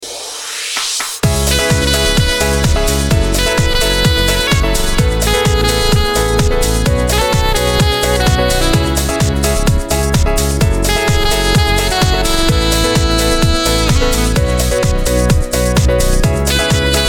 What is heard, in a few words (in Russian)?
instrumental naya muzyka zolotoy saksofon